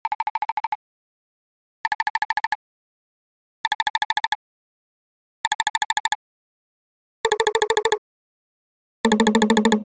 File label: Night owl; Nokia